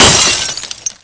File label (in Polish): szklo